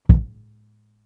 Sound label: Kicks; One Shots; Touch Loops; CosmicFunk